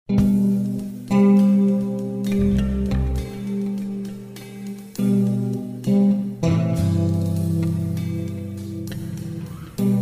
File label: Bass; Mobi-hybrid Musician Templates